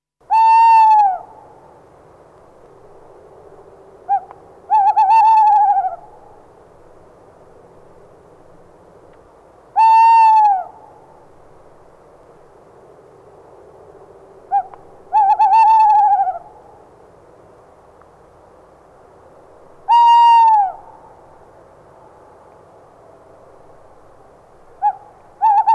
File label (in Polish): puszczyk